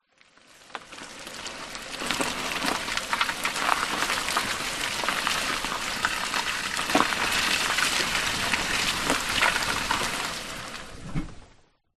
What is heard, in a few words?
transport car wheels roll on wet ground slightly muddy engine off